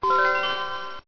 power melody; Netgear SPH200W Skype WiFi Phone; SystemRingTones; poweronoff

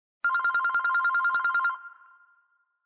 Business Ring; LG KP500 Cookie; Ring tones

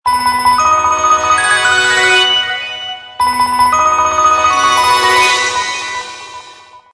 Tension Bells; Sony Ericsson; M600

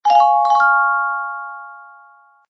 Alarm; Sanyo; Challenge Tablet 31TL04; Plus-i NotificationWidget

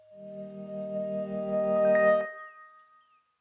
chime; Mudita Pure; snooze

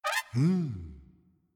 cable; LG; F520L-AKA; Egg

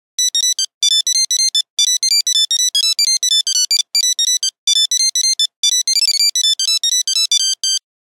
Nokia Buzzer 8310 Tap dance; Windows Phone apps

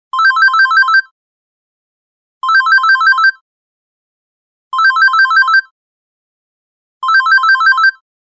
Alarm

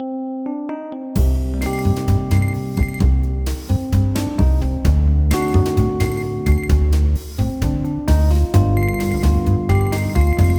Spanish, alarms

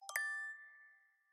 SMS, notifications; Yota Yotaphone-2

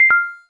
cam stop, phonetones, LG; LG Wine Smart F480K

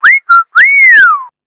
whistle

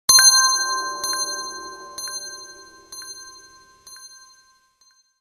Message

